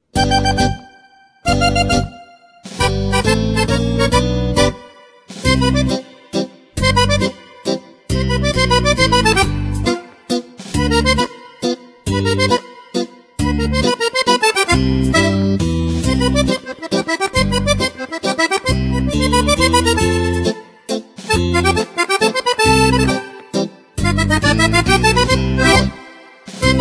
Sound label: mazurka; bertostudio